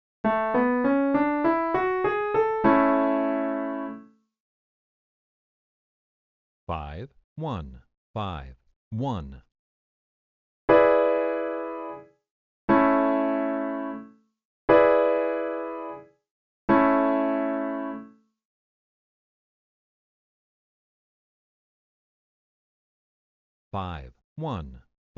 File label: I, V7, progressions; easy